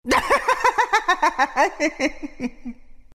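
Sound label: laugh, Chinese; Dota 2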